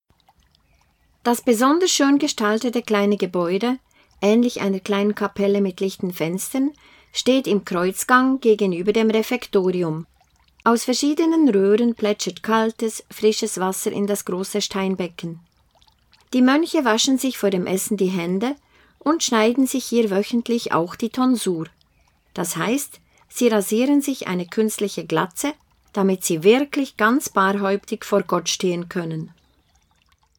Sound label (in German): Brunnenhaus; Kloster